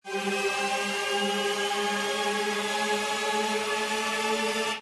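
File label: respin Sound; jackhammer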